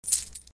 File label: Key Sound